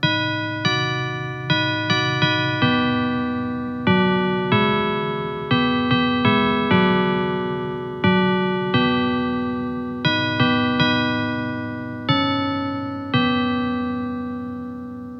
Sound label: English Bell Song; Bellmaster